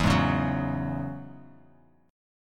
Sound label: E 6th Add 9th E down; E6add9 strummed; JGuitar